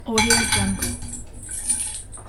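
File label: steel chain moving; hnatiukolha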